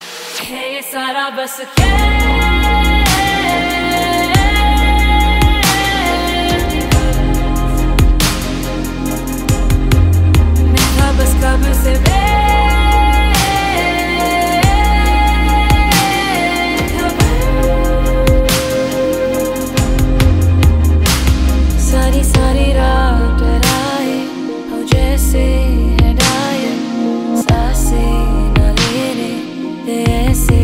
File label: Female Version Ringtone